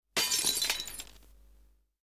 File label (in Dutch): Brekend Glas; KMP Film Video Sound Effects